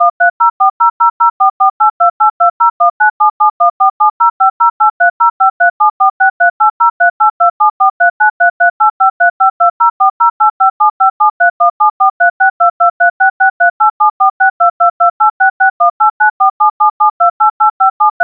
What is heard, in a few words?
dialtone